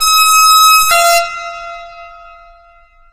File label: tank ping; video games; source engine; tf; mvm